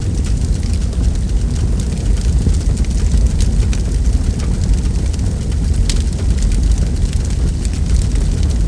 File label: burning; cstrike; ugxmas